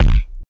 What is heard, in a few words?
thud